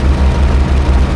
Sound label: arrow; Mafie 1